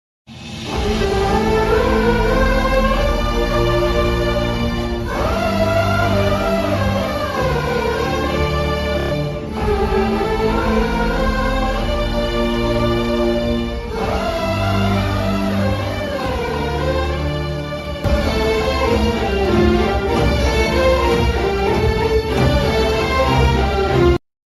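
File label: emotional climax background music ringtone; sita ramam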